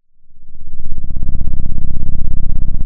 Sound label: pop, Sound of an engine revving